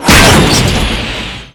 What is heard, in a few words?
impact trailing off